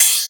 Open Hat, Cymbals; drumkits; fl studio; bvker drumkit

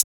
hi hat; hhat; drumkits; fl studio; maily plugnb

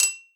top perc; drumkits; percs; fl studio

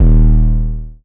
808 (D#); drumkits; fl studio; bvker drumkit; Born 808

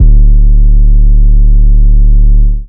808 (D); drumkits; fl studio; bvker drumkit; Born 808

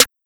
Snare Clean; drumkits; Snares; fl studio; young kico drumkit; Chronicles Of The Atlantic Trap (Sound Kit)

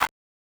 hh, Hats; drumkits; flowmaster; fl studio; goodtakimu drumkit

